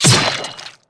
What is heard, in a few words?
Hit Weapon Katana